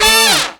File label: BRASS BLIZ, Hits & Brass